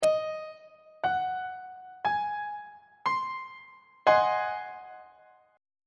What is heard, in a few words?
a flat dominant 7th 2nd inversion; on treble clef